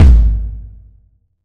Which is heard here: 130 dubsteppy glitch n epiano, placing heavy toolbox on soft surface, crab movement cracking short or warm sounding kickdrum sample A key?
warm sounding kickdrum sample A key